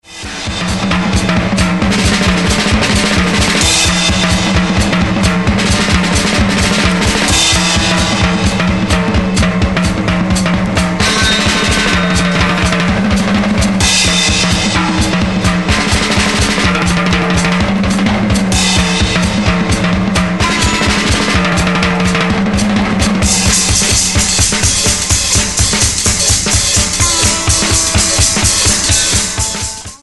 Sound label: Bellyboard Beat